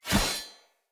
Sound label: Short Sword Whoosh; Weapons; Enemy; Swords; SinecureShortSword